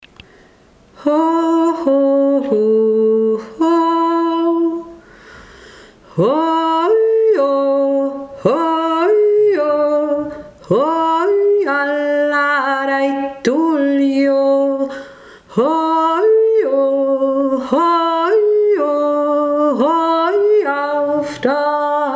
hohe stimme ho i o

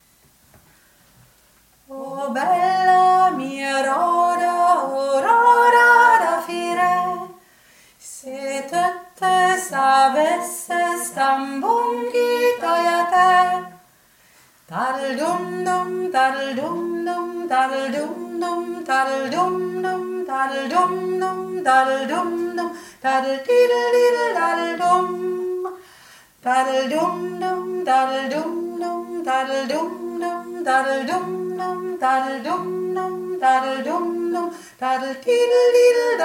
3 stimme; o bella mia roda

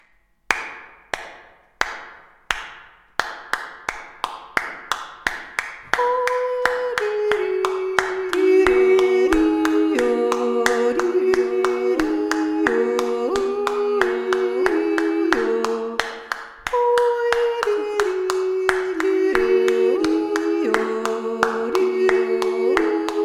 hui di ri jodelmelodei klatschen

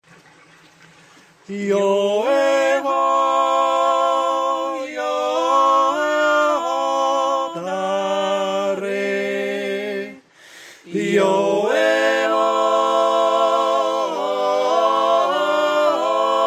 3stimmig; marienberger

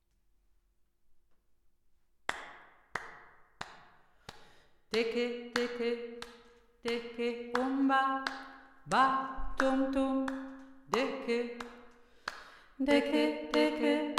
deke umba 2. Stimme; Deke deke, deke umba, ba tum tum, deke

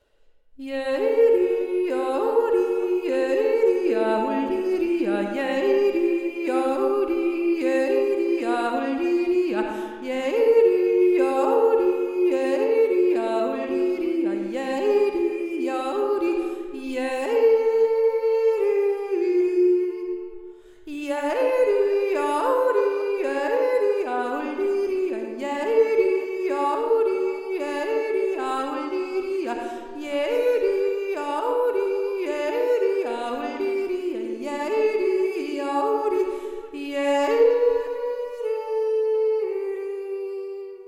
j idi hauptstimme, jodeln; drinnen und draussen jodeln im waldviertel 2024

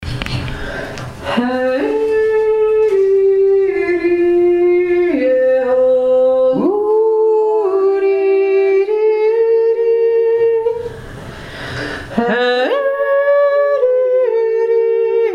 sonntagsjodler

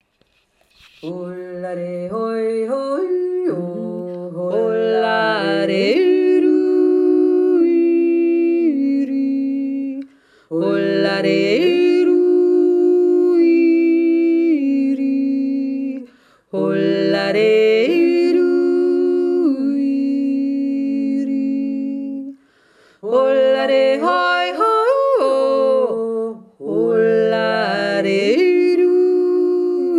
grundlseer mittlere stimme, jodler

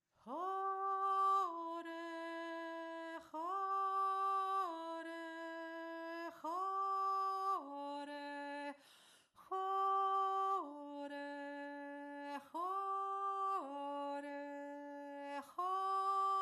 mahnjodler jodler

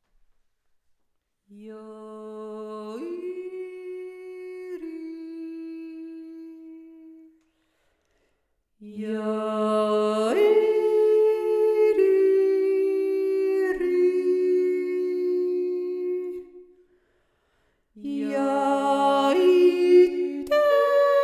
zweite stimme, jodeln; der oanfosche stade; drinnen und draussen jodeln im waldviertel 2024

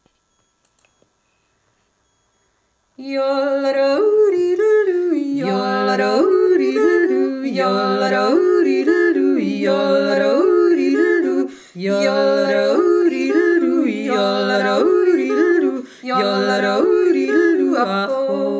heidis jolaro untere stimme

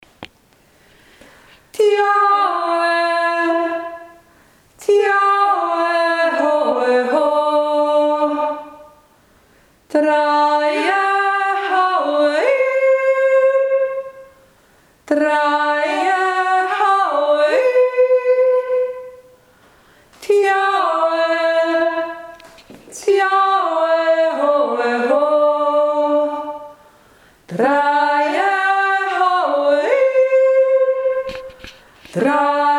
der berbachjodler

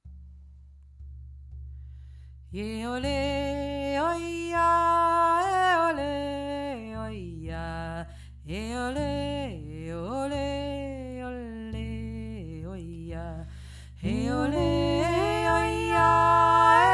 sonnenjoik

